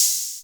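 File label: open hihat, Yamaha MR10; drum-machines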